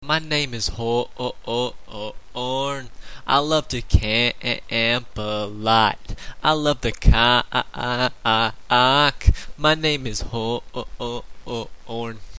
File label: horn